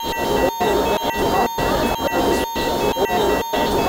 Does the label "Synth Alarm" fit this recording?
Yes